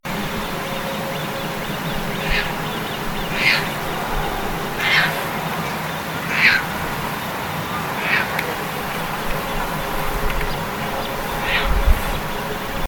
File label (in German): Raubseeschwalbe; Martin Goepfert